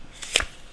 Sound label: draw; RS Games Sounds; Uno